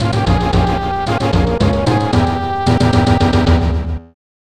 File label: Player Down; Donkey Kong Country 2; SNES; Crocodile Cacophony